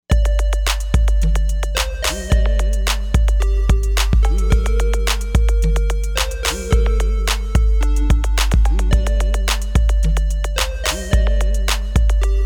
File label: AKAI XR20 Factory patterns; HUMMER